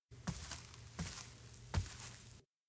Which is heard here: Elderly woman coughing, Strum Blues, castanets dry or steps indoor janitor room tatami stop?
steps indoor janitor room tatami stop